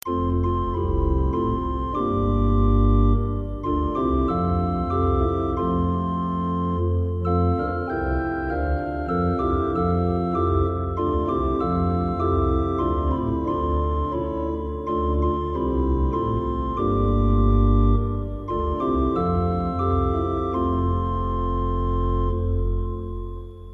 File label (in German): Jesu geh voran